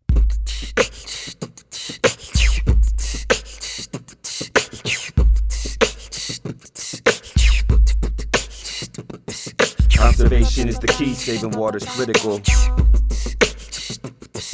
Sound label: beatbox and poetry; Drought